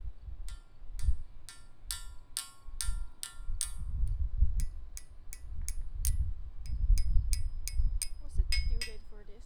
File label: hitting the pole